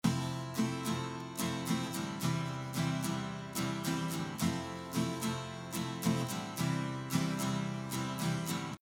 Guitar Before; SuperVHS

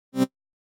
Synth With Fast Decay

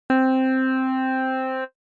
Wavetable Synth

